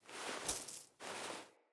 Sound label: Coin Toss Foley; Level Events; Midrath